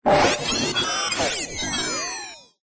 science fiction machine or robot glitch

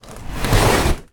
foley cardboard box object slide out; zapsplat

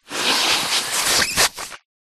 human blow nose into tissue paper

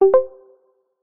notification tone soft subtle

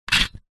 staple gun metal dial turn single; office

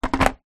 household kettle cordless down on base

